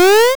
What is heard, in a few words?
Jump; pygame platform